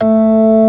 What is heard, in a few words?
ORG A3, B3; B3 FULL